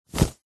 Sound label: foley clothing jumper fast short movement flap; zapsplat